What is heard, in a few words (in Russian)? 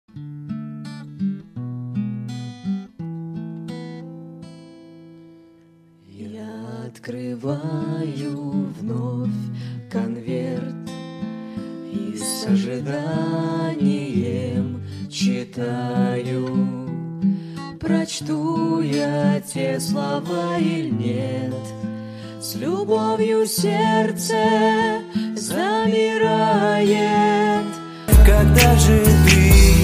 Вокальная нарезка